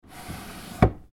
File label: Chest Drawer Close